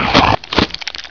bull gore; svencoop; hunger

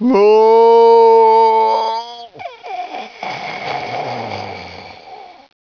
torment; svencoop; hunger; thambs

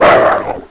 pit drone die; svencoop

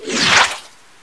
headcrab head bite; svencoop; schall

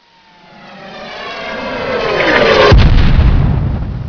incoming; svencoop; hunger; thambs

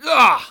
pain; bodyguard; svencoop

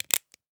357 idle fidget pull

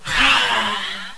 alert; svencoop; schall

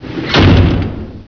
door; svencoop; hunger

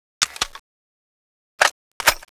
sniper reload first seq

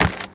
dirt; svencoop

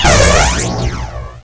gauss; svencoop; mommamesa; megagauss

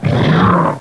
shock trooper die

